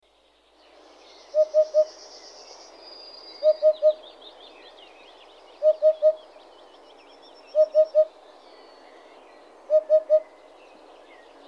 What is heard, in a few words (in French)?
huppe fasciee